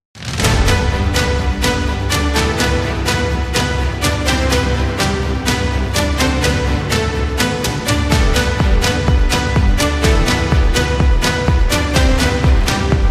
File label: Motivational Background